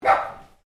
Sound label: Animal Dog Mini Fox Terrier Bark